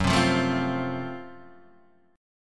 F sharp Minor Major 7th Flat 5th, Guitar, Half Step Down